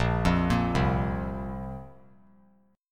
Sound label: Bb Suspended 2nd Bb down; arpeggiated